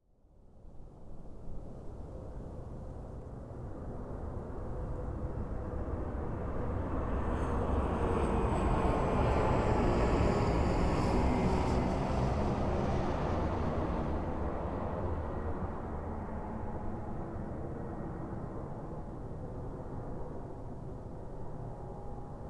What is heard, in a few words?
Jet